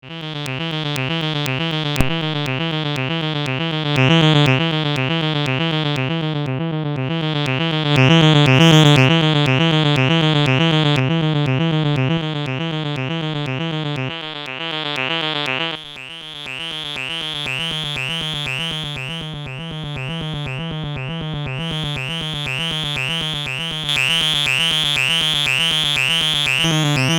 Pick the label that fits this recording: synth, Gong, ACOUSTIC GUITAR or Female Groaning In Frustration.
synth